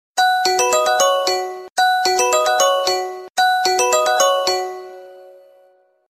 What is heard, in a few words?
Music Box Sms